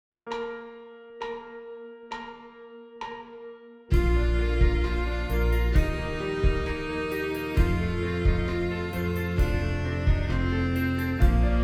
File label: Exercise